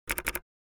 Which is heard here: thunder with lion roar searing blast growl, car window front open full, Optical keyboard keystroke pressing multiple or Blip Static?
Optical keyboard keystroke pressing multiple